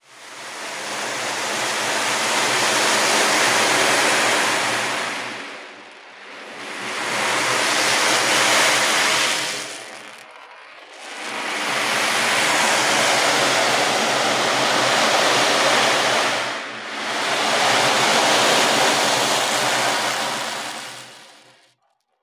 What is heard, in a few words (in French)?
Tambour ocean; le nid sonore